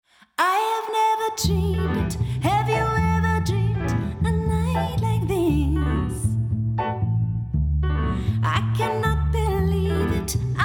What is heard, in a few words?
bassboost